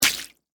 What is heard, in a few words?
Water Splash Throw, Drink; Splash Sound Effects; Splashes (Sound Effects:Objects); BlastWave